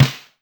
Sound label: Snare, Pitched Down; Clavia DDrum-AT